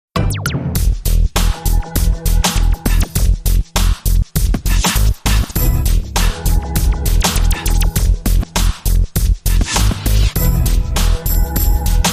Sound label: R&B Slammer